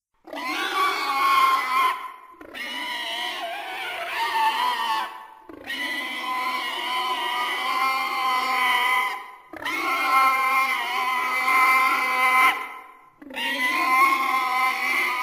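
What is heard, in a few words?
orc squeal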